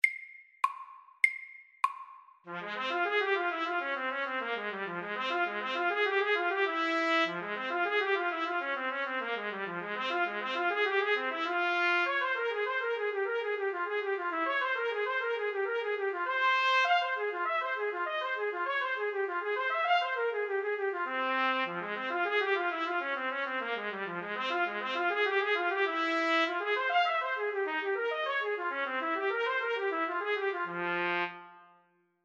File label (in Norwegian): G minor etude FORT